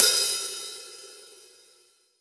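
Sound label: Acoustic Hi-Hat Open; Roland SPD-S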